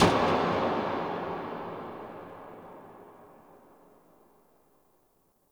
Creepy Metal Swell Clank

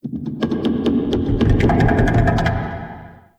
Distant Clicking; Ambience; Warframe; Duviri; Murmur

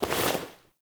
Hand Stung Pullback Foley; Sacrifice; Lotus Helmet; Cinematics

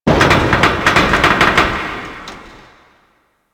Rescue Hostage Bang On Door; Warframe

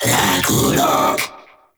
summon vocal; Warframe; Uriel; Vythelas

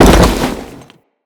Fall, Foley; Characters; Tenno

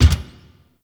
Gear Shift Up, Motorcycle; Warframe; Vehicles; Motorcycle Ninety Nine

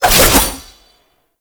Passive Sickle Spin; Warframe; Dagath

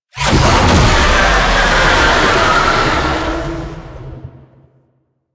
Proto Infested Babau Spawn, Vocals

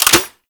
Goo Gun Mech

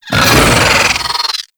Pet Vocal Angry; Warframe; Alad V Pet

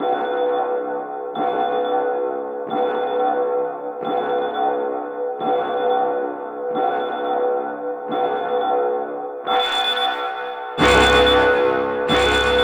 Alarm Sequence; Warframe; Tau; Cinematics; DarkRefractory

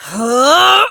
Punch, Barks; Kuva Lich; Persona B; Ability

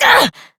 Pain Short Operator Voice; New War

